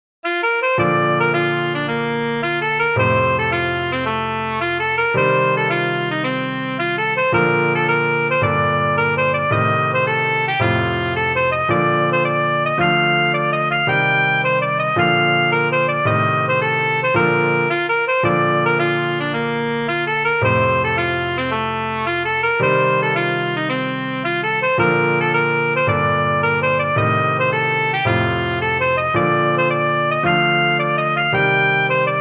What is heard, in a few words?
Alpen Music Box, Clarinet & Piano; Clarinet Part